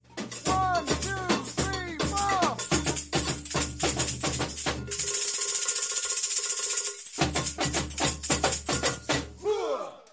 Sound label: Baiao End break; rhythms